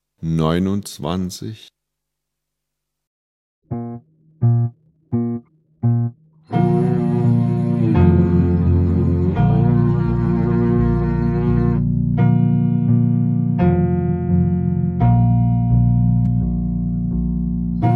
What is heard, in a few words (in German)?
Klang, Hornschule